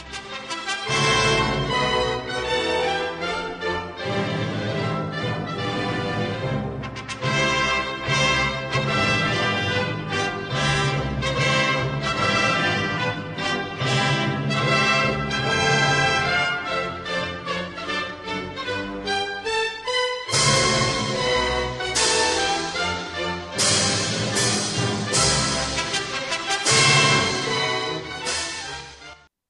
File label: weding